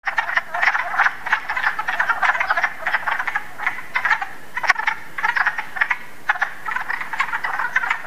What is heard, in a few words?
Wood Frog